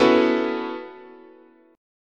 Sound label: B 7th Suspended 2nd Suspended 4th, guitar chord